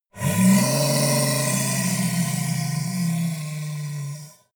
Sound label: monster dark growl hoarse; kreaturen